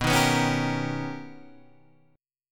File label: B Augmented Major 9th B, chord; B+M9; strummed; 7,6,9,6,8,6; Standard; JGuitar